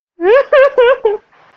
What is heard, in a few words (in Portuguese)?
dando risada; preto